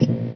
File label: soft switch